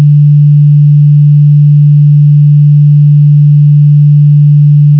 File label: tuner d